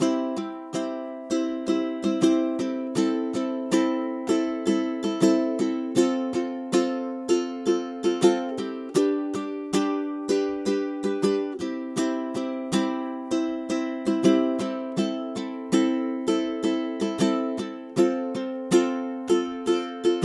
bicie ukulele

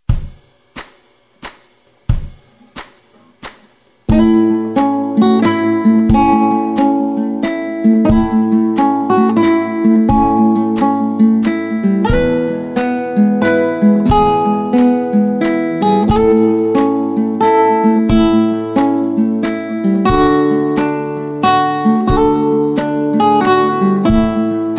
cicha noc

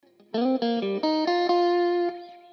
zagrywka blues wejscie na bridge zagrywka C F, gitara

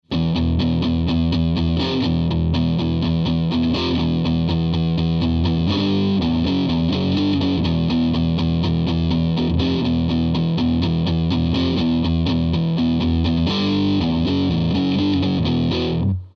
riff, gitara

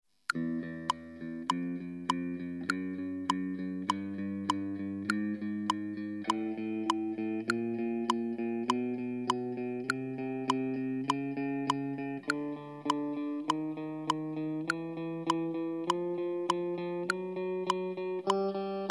skala chromatyczna po 4 dzwieki, gitara

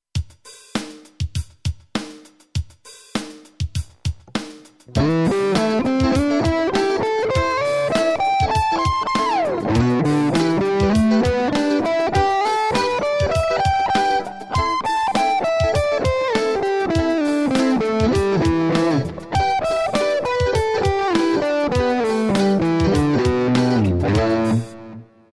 pentatonika przez 3 pozycje, gitara

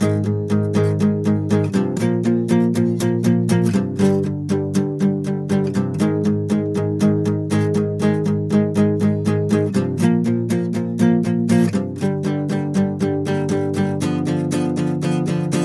przedrefren gitara

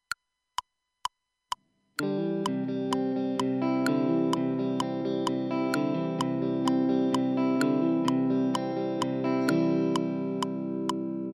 wstęp akord C

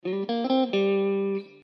zagrywka blues wejscie na bridge zagrywka G G, gitara

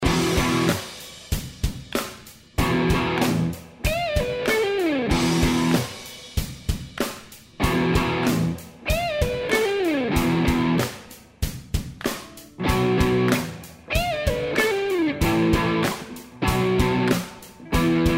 zwrotka, gitara

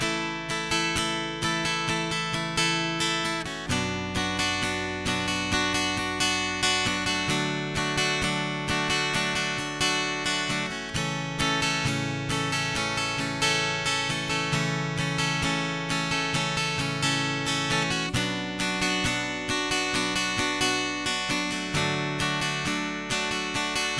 bicie AhDA 2 powtorzenia mala zmiana bicia; Lekcja2.5

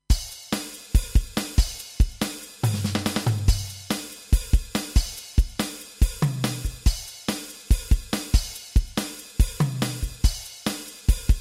rock and roll perkusja

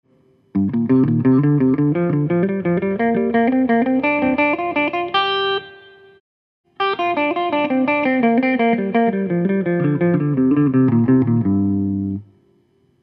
pentatonika g-moll triole

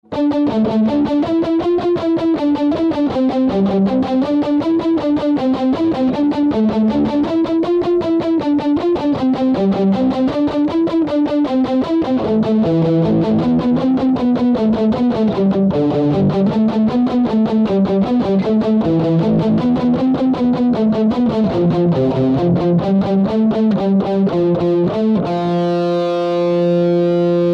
riff, gitara

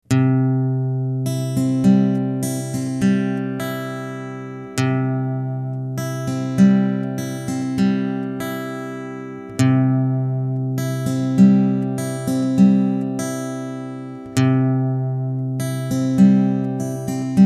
pasaż 4/4 C dur zmieniony, gitara

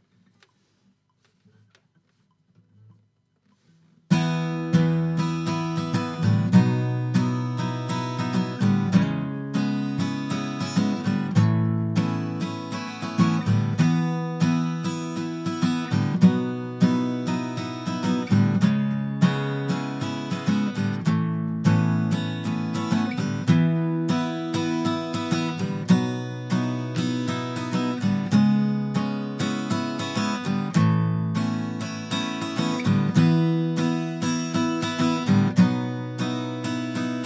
przepis na rzewna ballade strój e

